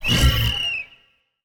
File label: ui lose score